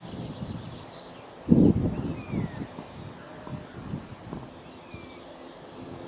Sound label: trisyll disyll; northumberland